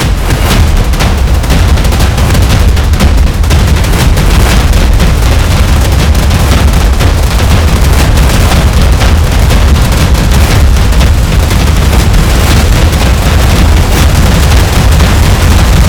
tension, horror excitement thriller song; By Burak Sound Bank

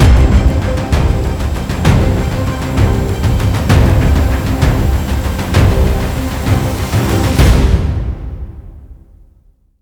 voltage violin composition; gerilim; tension vol 4; By Burak Sound Bank

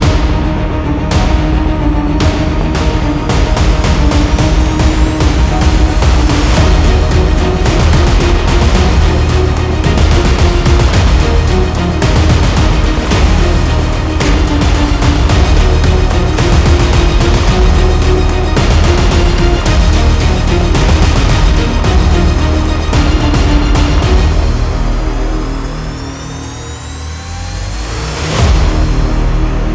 tension; By Burak Sound Bank; tension gerilim vol 21

